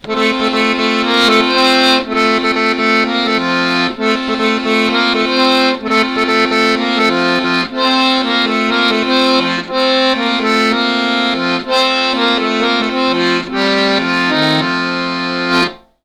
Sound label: outro music accordion mono